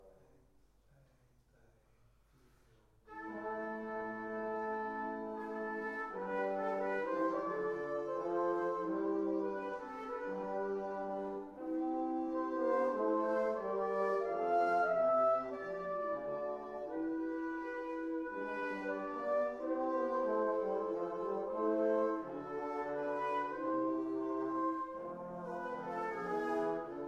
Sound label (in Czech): z kostela instrument